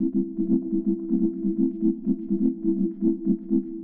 SYNTHPAD HOUSE 125 A; eJay; Sphere

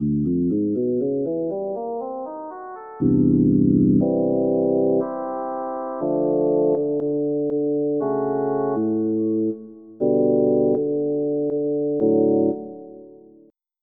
Tuba; Onj's Soundfont

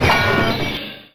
Ball Break; Geschicklichkeit; Hamsterball

